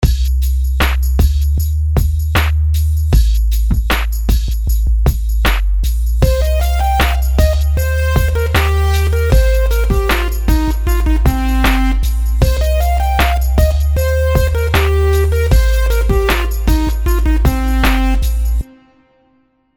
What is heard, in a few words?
rhythms simple 16ths